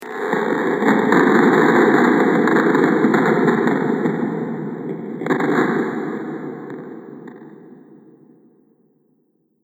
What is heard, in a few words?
Underground Explosion; Bomb Sound Effects; Impact / Explosion Sound Effect